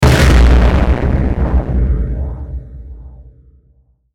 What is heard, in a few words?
Hit Heavy Distorted, Hit Heavy Distorted Phaser; Blastwave